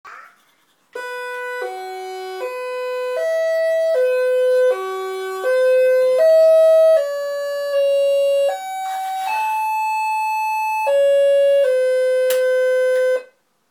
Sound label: Hells Bell